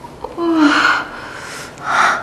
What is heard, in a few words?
urgh